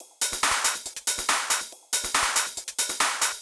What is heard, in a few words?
Loop 140BPM, Drums & Perc; Vengeance Samples; Space Of Time Kit; Gminor